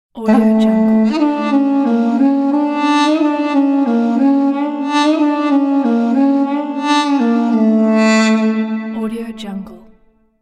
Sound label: duduk transition